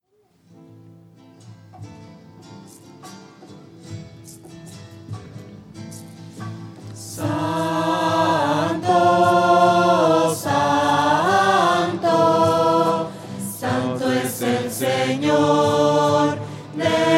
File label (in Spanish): santo lento y triste, voces; misa